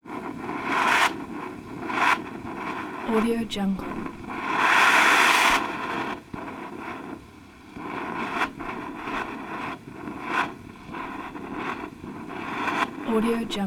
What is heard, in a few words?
natural steam vent constant